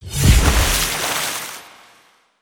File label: waterfall whoosh splash; ambience